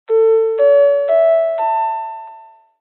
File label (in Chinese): 钟声; 广播室铃声系统